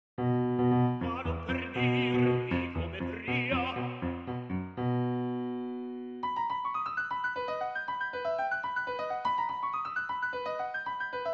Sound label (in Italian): base musicale